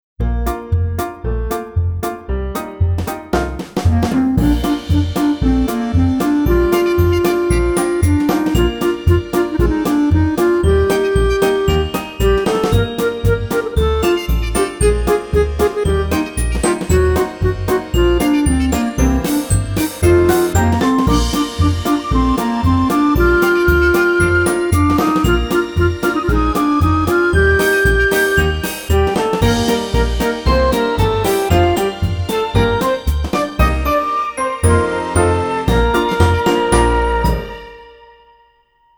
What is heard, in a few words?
Bend And Spin, exam music